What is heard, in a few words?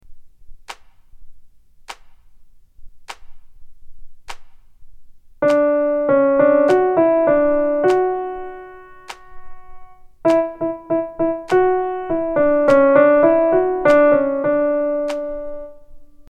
Melodic Dictation